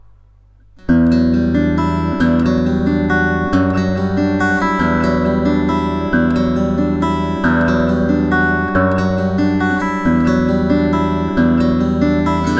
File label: yairi, guitar